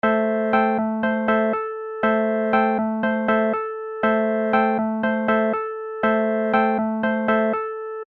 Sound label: wurlie